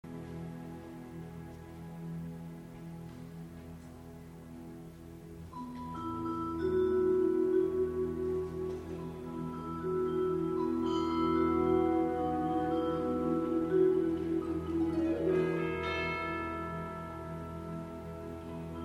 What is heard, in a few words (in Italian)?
recitativo epilogo